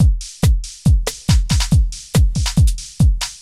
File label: techno 140